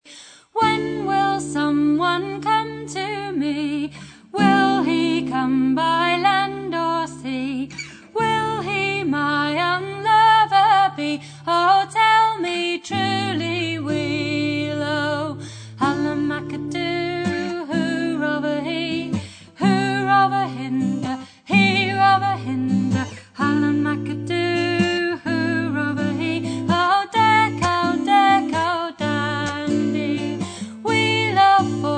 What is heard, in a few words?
Spinning Song